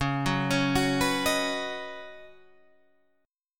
C sharp 9th Suspended 4th C sharp down Guitar Half Step Down, chord; C#9sus4